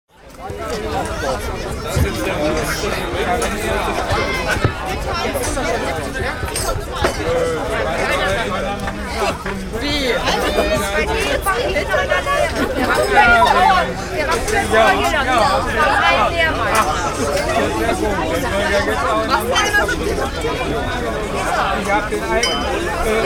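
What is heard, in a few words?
sounds of bar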